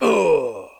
death; Ace of Spades

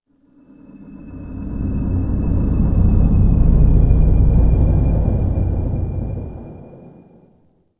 City Flyby Large; Ambient; Sid Meier's Civilization 4 Complete; Beyond the Sword

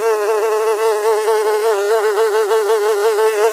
housefly loop; ambient, creatures

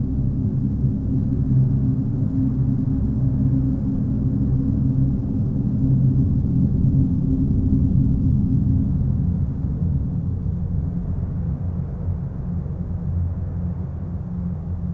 Afterworld Amb Bed Lp; Ambient; Sid Meier's Civilization 4 Complete; Beyond the Sword

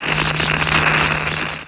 REPAIR VEHICLE SPARKS; Emperor - Battle for Dune

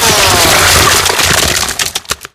chainsaw gore; npc; melee; infected

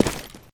concrete, footsteps; Counter-Strike Global Offensive

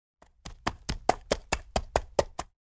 Horse; Age of Empires III

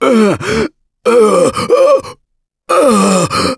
Choke, russian, Survivor Voice; Gambler

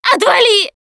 Scream While Pounced

